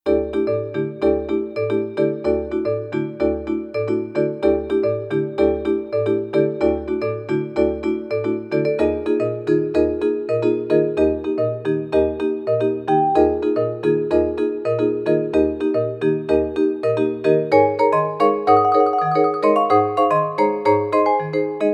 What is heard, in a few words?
Vibraphone